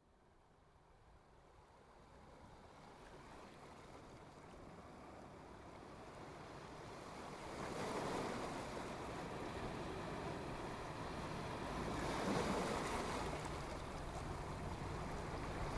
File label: ocean